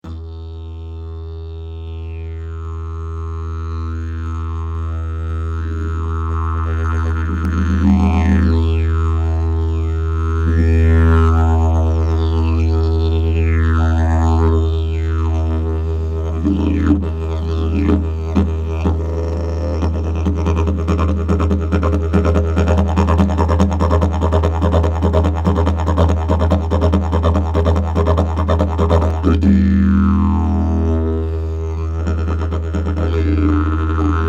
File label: didge